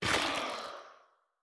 幽匿尖啸体破坏声

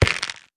菌柄 踩踏声

